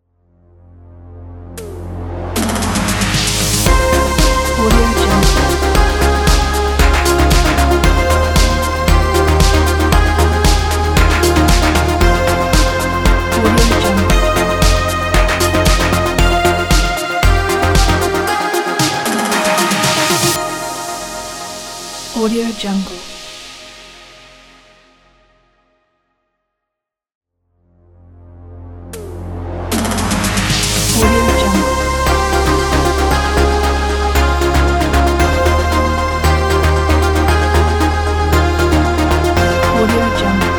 synthwave logo

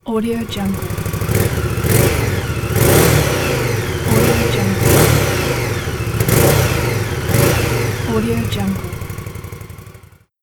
motorcycle accelerates

